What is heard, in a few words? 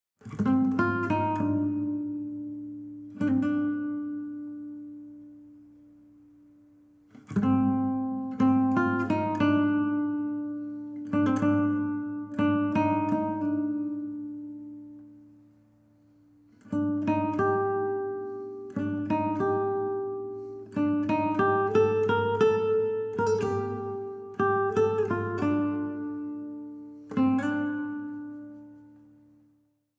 Savannah Music